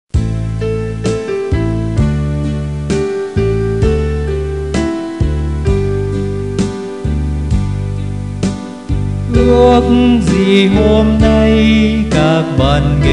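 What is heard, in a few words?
soprano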